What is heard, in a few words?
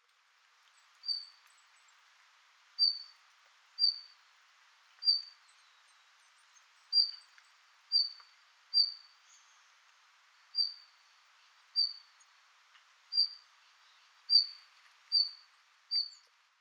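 Red-flanked Bluetail; chichibu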